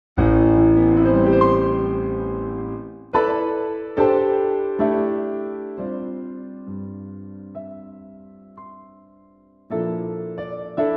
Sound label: easy keys sorrowful